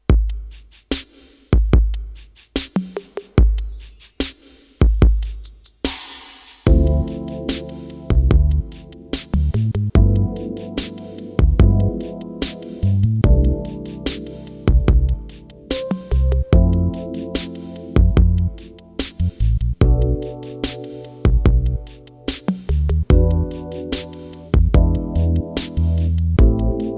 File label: YAMAHA TYROS